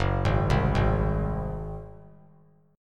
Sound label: Gb Suspended 2nd Flat 5th 3C,3F,3A,3D; Gbsus2b5 arpeggiated